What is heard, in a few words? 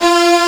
Strings G2